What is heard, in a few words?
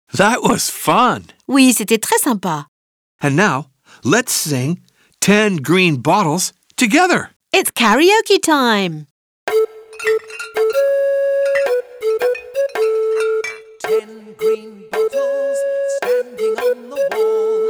LETS SING Ten Green Bottles Karaoke